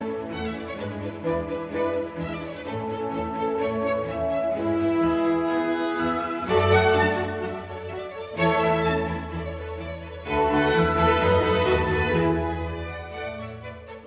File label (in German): violinkonzert nr 5 a dur allegro aperto